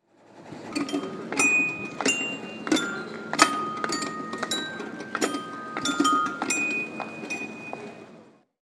musical pavement

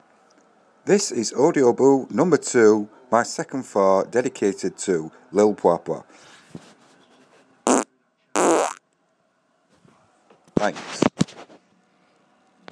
fart

